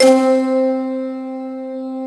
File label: keys; DCS KEYS